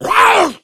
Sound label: hunter shred, attack voice; source engine; left 4 dead 2